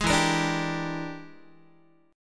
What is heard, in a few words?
E 7th Sharp 9th Flat 5th, Guitar; strummed; chord; JGuitar